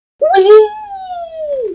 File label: wee